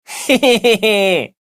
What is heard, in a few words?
laugh